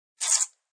Tearing Velcro sound, Velcro Rip Fast; Ripping

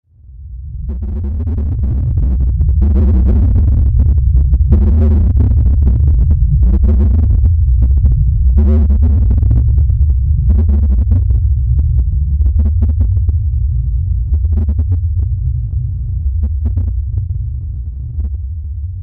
Sub Bass Subspace; science fiction sound effect; star wars / trek style

